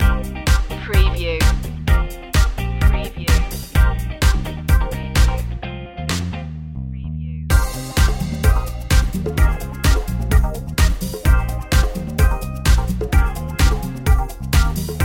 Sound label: pop city loop